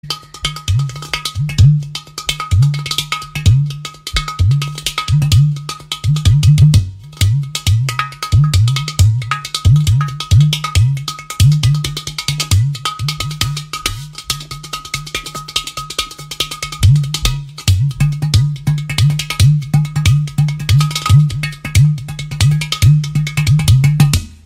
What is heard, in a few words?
kim kim; کوزه